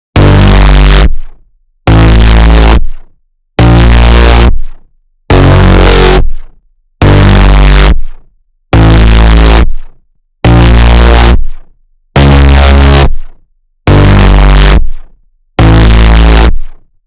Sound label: bass